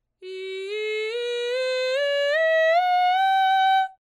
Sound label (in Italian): VOX Scala